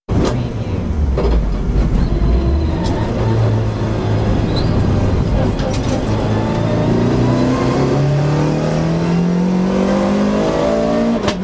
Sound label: Rally car race excerpt; beanstalk audio